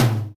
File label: Low Tom; puchicon; dsi ds